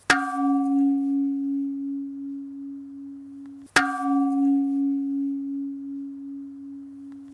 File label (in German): Bronzeglocke